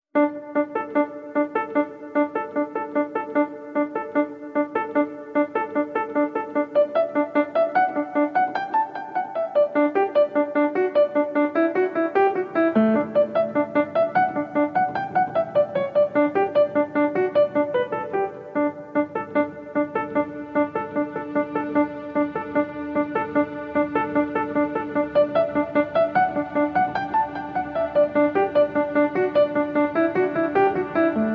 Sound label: No Strings